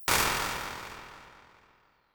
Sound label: Old Spring, impulse responses, spring reverbs; Medium Spaces; Apple